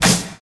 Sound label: sharp snare single hit a sharp key